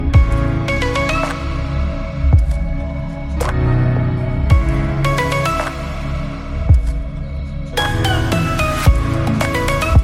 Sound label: Huawei Mobile Ringtone